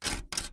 move, Crate

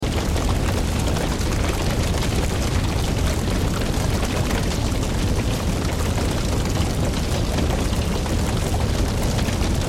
fire

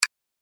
hover

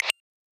move succes

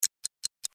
day and night switch

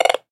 beep, metal detector

